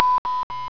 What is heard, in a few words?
Shark Lower; Spectre Supreme Demo